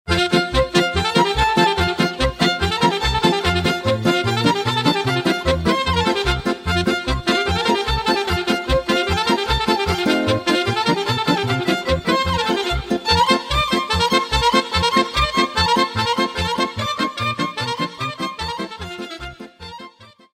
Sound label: Sirba; Roumanie